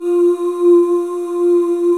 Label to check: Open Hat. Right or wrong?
Wrong